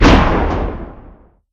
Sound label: Birds Bomb Shockwave; Angry Birds